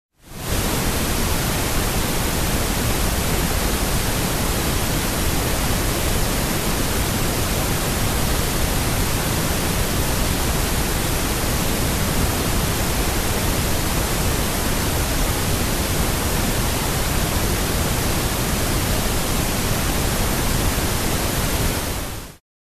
White Noise; Relaxation

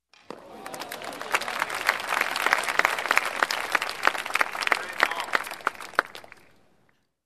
Amazed Crowd Applause

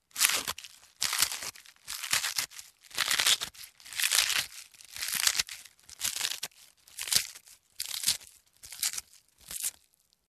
Munching

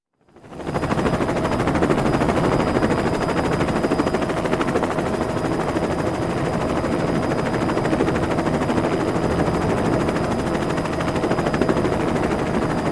Helicopter hovering in air nearby

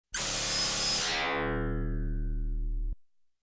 Weird metallic FM synth sweep

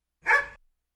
Short dog yipping sound

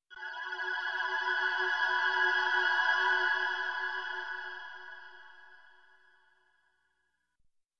Sci-Fi dreaming vision

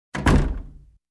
Wooden door close bang

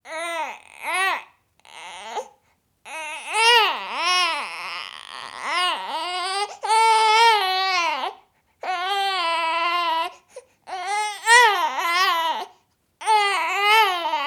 Baby whining

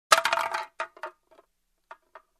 Soda can kick

